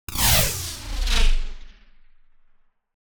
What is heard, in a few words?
Whoosh Sharp Wave Going Down; Hollywood Edge; sound designer's toolkit v2